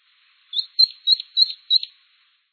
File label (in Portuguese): bico virado miudo